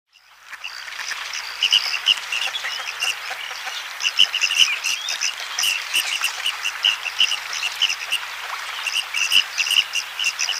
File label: Red-crested Pochard flock